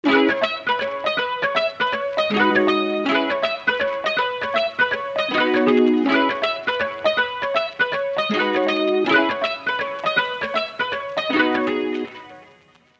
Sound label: Counterpart C#m 80, Lo-Fi MIDI Melody; Unison Lo-Fi MIDI Melody Collection